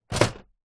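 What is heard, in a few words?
electronic typewriter set down on wood desk; office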